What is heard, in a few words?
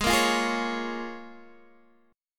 Db 6th